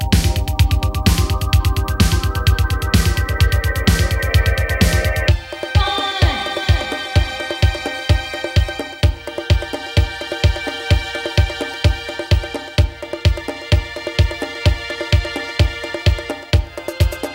Bonus Beats

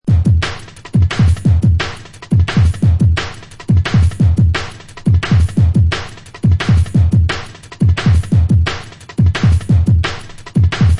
Beaffy Bobby Steppaz Break, beats; ray keith dread jungle breaks vol 1 60 beats for dubplate clashes battle junkies

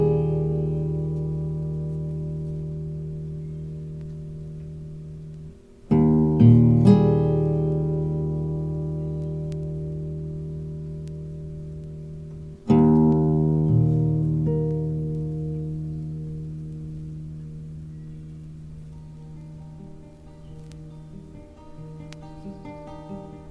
Mostly Chimes (instrumental)

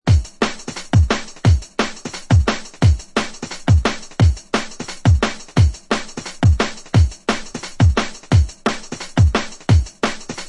Jungle Shuffle; jungle breaks; ray keith dread jungle breaks vol 1 60 beats for dubplate clashes battle junkies

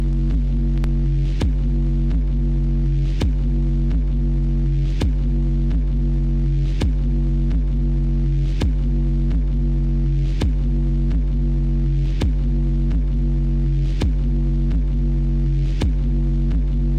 Locked Groove

